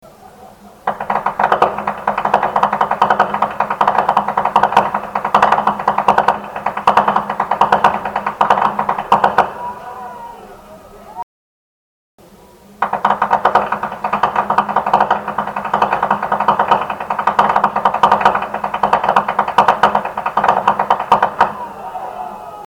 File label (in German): schnalzen; feldkirchen